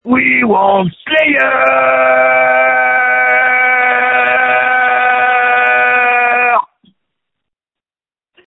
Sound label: screams